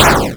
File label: explosion